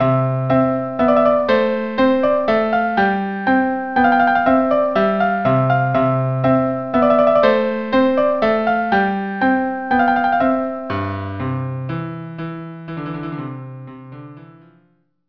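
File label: minuet violin cello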